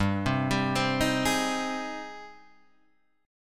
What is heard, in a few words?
Ab Major 7th, chord